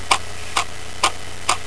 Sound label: Slow clock; Prometheus 1.0